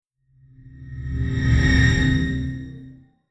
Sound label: Whoosh Space Vehicle Slow Spacecraft; Scifi Science Fiction